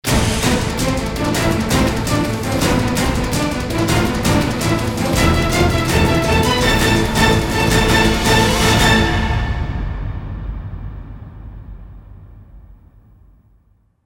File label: Run